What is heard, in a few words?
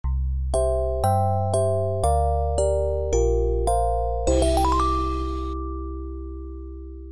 game over; magician lord